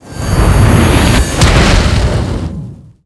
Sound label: Heavy Tank Cannon; blue archive